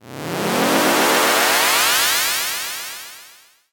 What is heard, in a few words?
transform; anime